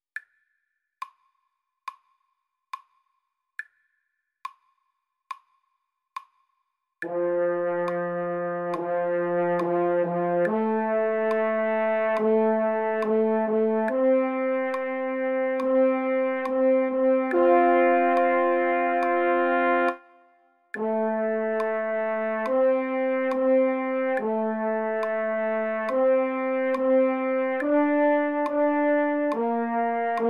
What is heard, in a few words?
fanfare